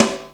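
clean steel snare drum sample a key, tuned to the A note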